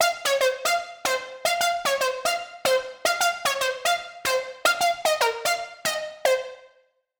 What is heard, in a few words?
Melody Pluck Dubstep; midi wizard 2; Unison